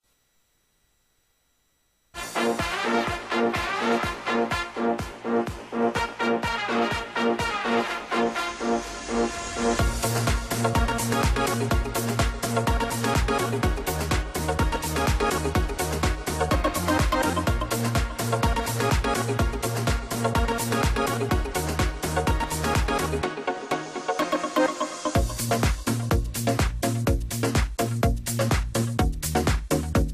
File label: Instrumental